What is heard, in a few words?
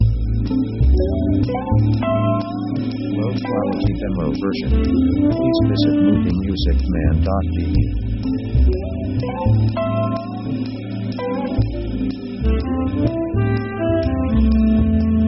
jazz 124bpm cool; synth themes